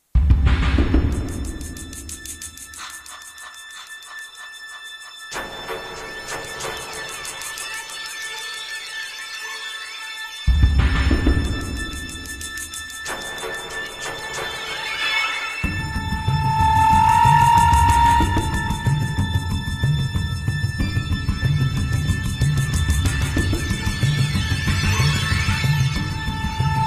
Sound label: Creepy; World War II Combat: Road to Berlin; Xbox